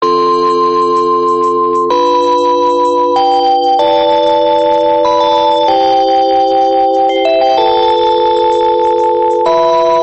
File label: Panasonic Ring